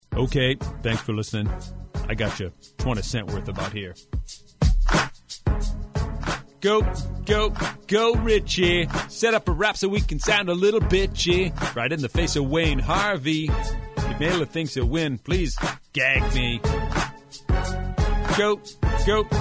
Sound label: RAP